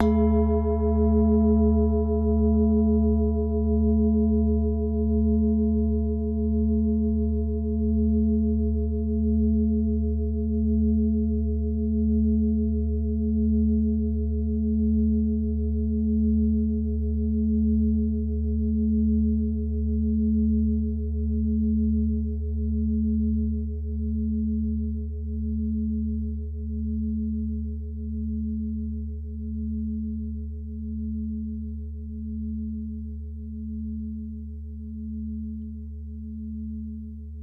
Singing Bowl Low And Loud

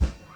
Snare

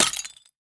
Tile Breaking

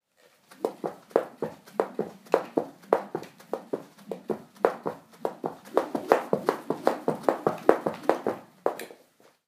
Skipping

